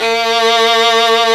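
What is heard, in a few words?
STR VLN, violin; AMG - Now CD-ROM (Roland); Art of Samplng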